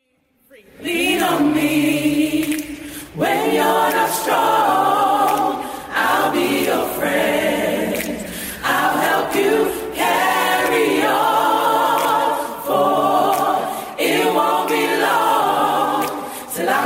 gospel choir

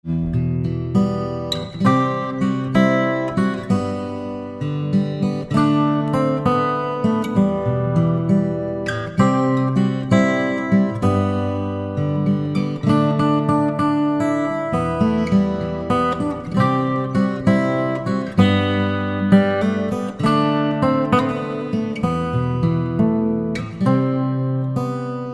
guitarist

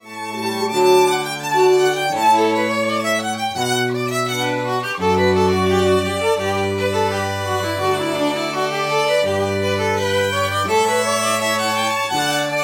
strings

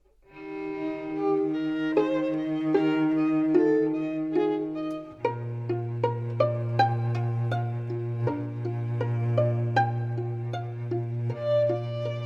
strings; classical musicians

